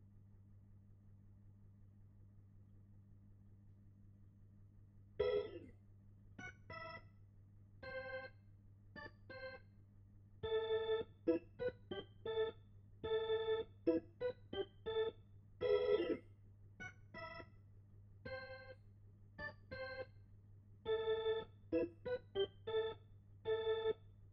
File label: Hammond